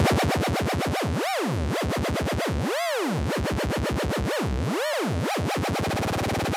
wobble synth

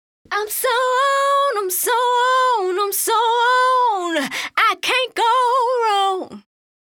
harm g sharp

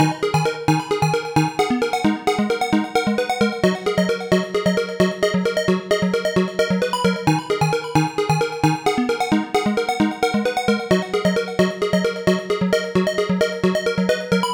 pluck finale

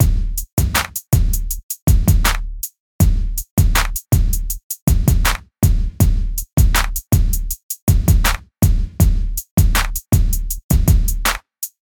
drum loop; finale

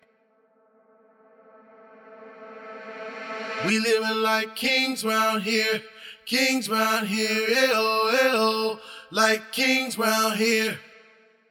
reverse reverb example

